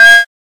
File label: Flute Failstate; Instruments; Zomboid; Lifestyle